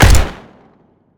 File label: machine gun shoot; hygrounds